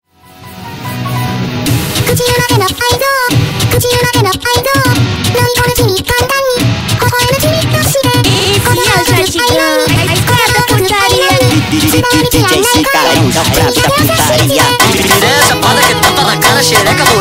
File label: funk sped up